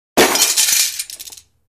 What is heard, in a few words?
Bullet Impact Glass D